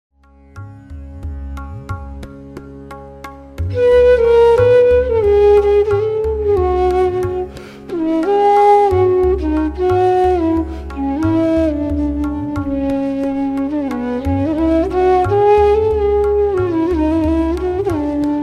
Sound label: Drut Teental Bhairavi C sharp